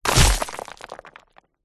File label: impacts object heavy thud dirt stones debris flying